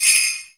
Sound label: SLEIGH BELLS; Greytsounds VOL-1; ORCH PERC 1